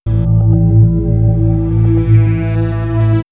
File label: Swirls Swirl, pads; X-Static Goldmine